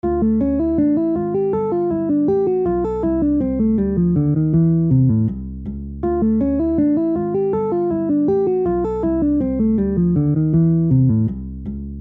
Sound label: major 251